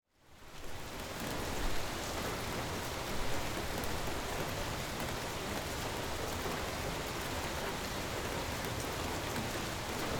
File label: Rainfall Background